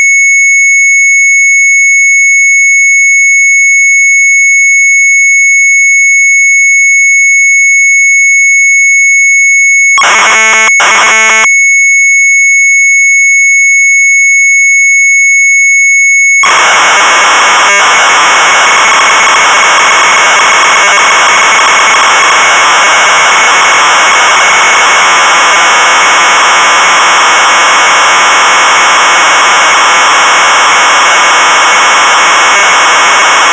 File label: MZ800 Tape, sharp; VISITCARD.800; retromelsarchive